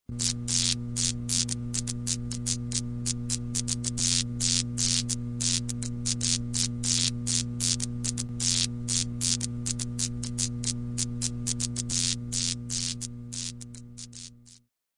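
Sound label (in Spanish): Sonido De Luz Neón Parpadeando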